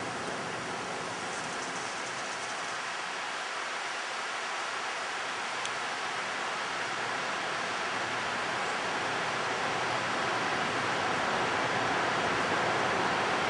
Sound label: Carolina Wren; Eating